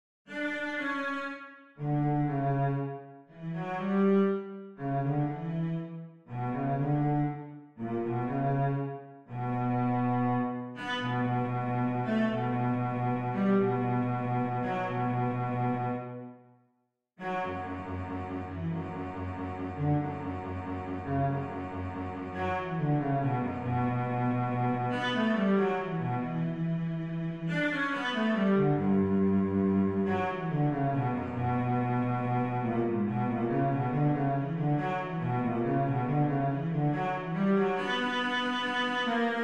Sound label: summer cello melody; fourseasons